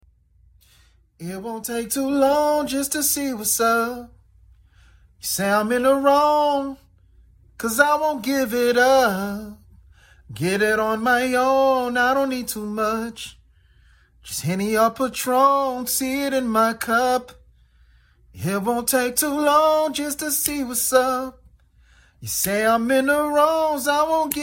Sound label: rnb soul 100 Dm, Acapellas